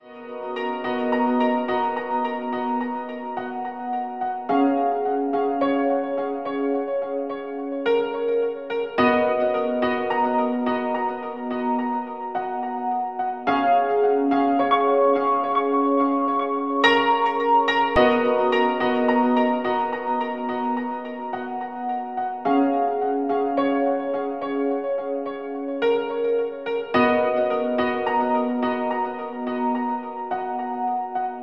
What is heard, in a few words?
loop dusk